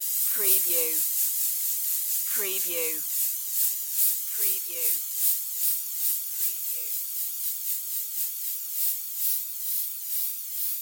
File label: Pesticide Spray Move